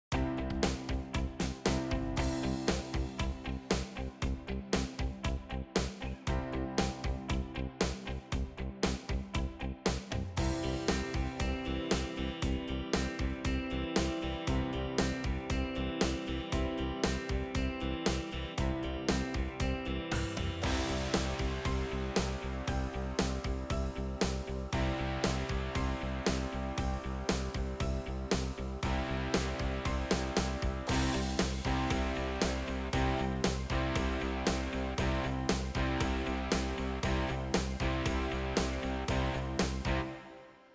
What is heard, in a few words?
80s Guitar Pop, PSR SX900; Styles im PSR SX700 und PSR SX900 unterschiedlich